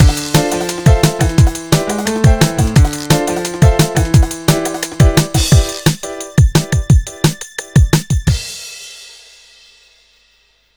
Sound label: JINGLE (Jingle-Jingle); Alfa Romeo Racing Italiano; PS2; Xbox